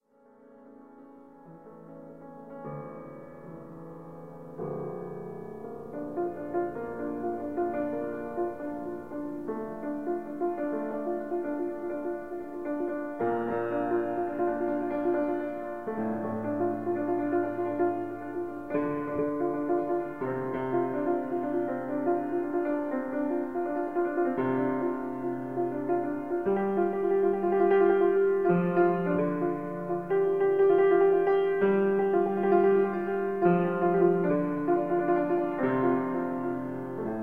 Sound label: Improvisation Fragment